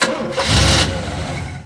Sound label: ford V8 on; Mafie 1